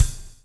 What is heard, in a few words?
BLUES TK, Backbeat; Spectrasonics - Backbeat